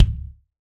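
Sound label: BD DRY, bass drum; Real Mega Drums; Best Service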